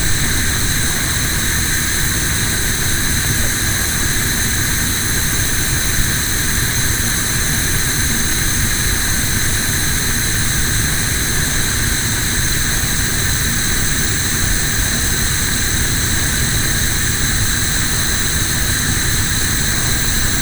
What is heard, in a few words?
gas flame mix